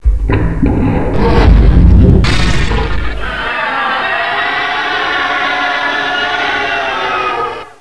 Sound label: mortar fire; tubequeen